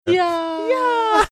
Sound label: making contemplative scream sounds